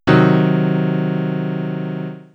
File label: C diminished triad, piano